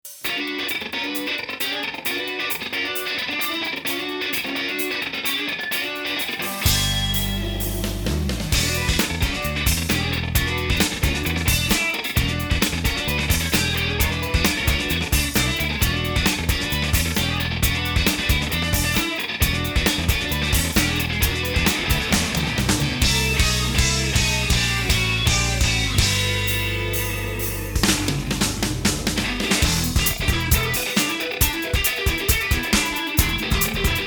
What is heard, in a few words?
funk jingle